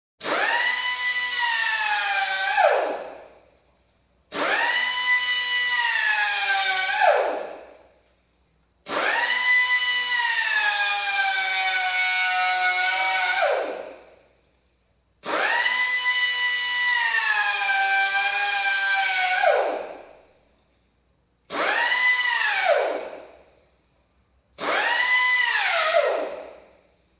Air Drill; Short And Long Spurts. Interior Shop Or Ga; Short And Long Spurts. Interior Shop Or Garage Reverb, Medium Perspective.; Drills, Drill Press